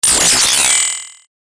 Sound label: electric sound